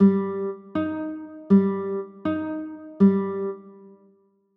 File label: e flat maj, chords